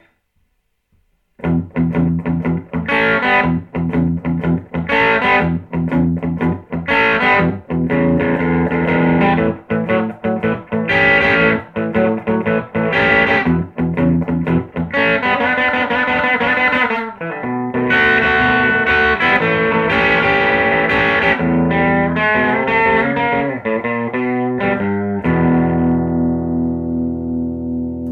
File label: humb OD bridge, guitare; HUMBUCKERS GNB